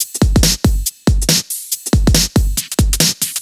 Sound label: Breakbeat 140 BPM